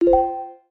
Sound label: dropoff confirm, mono; dokobots